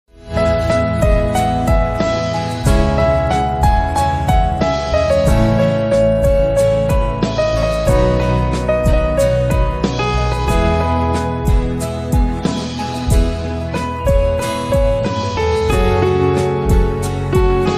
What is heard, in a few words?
Piano